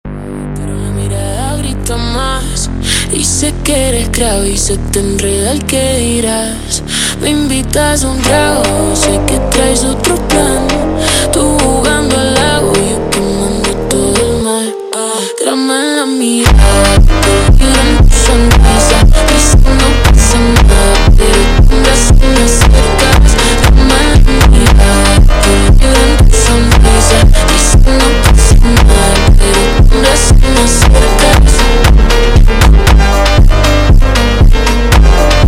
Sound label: slowed; funk